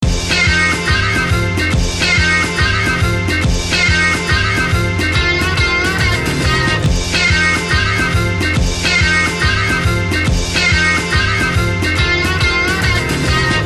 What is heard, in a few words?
solo